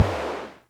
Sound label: Music Percussion Computer Tom, Drum Machines